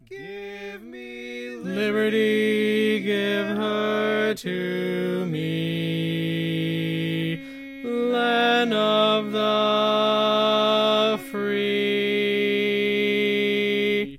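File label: Baritone, barbershop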